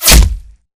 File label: bullet light ballistic flesh; Titanfall 2